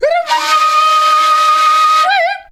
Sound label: FLU + VOC, FLUTE + VOCAL